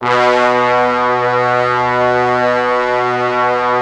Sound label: B1, Orchestral Brass; Best Service ProSamples vol.20; AKAI